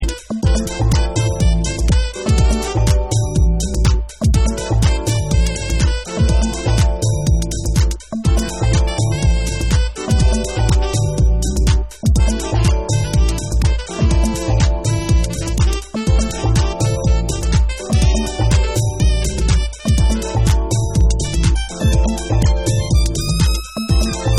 Sax

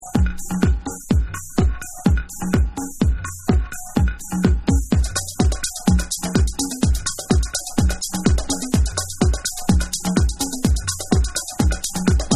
House Groove Mix

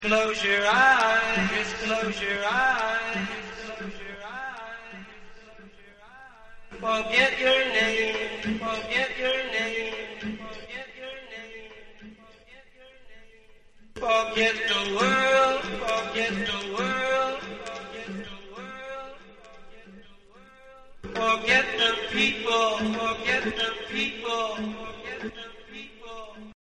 Acapella

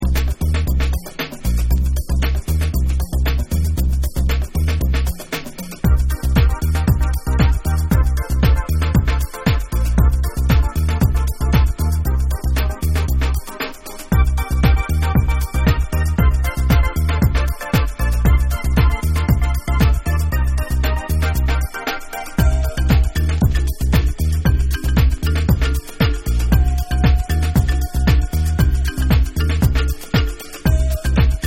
Bonus Beats Mix

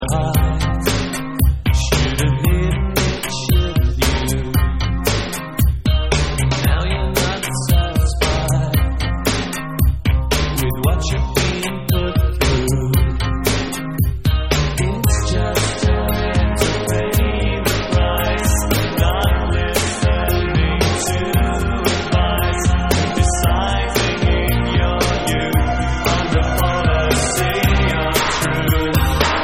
Beat Box Mix

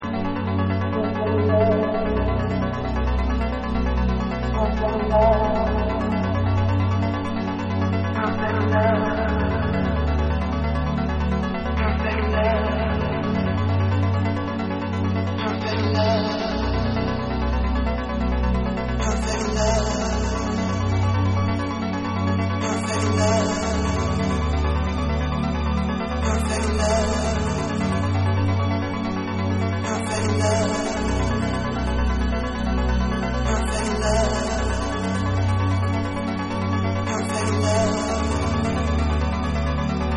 Ambient Interlude

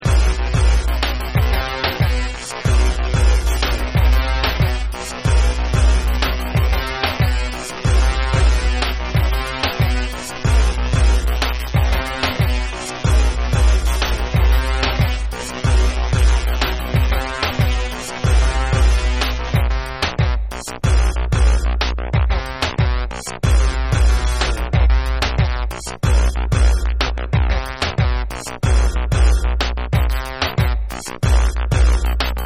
Instrumental

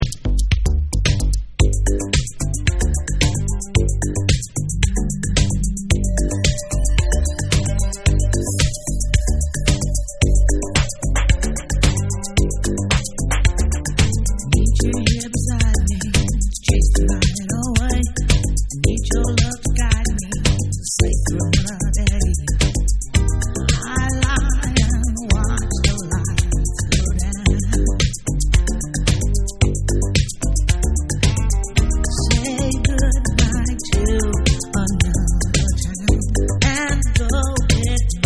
Vocal Dance Mix

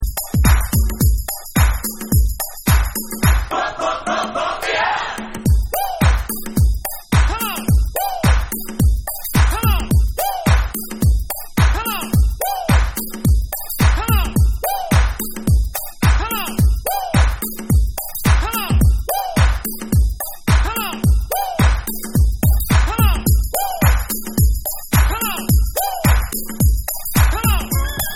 DRUMS

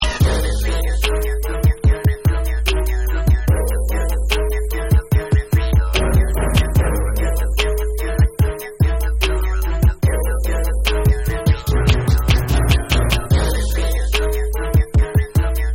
Instrumental

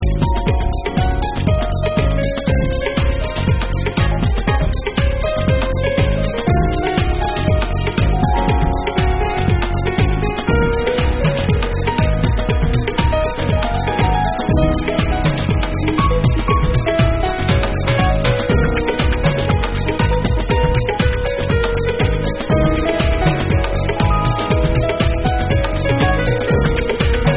Ballad Mix

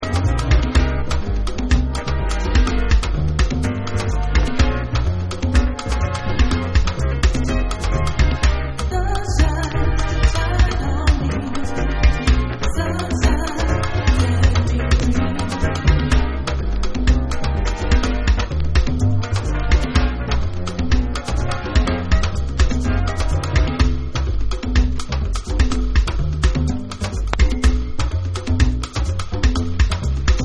Bossa Break Dub

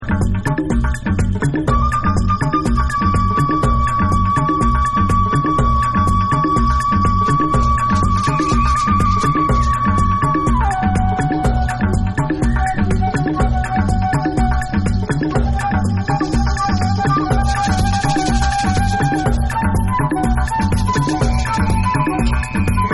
SAMBA DE ILE Bonus Beat Flute

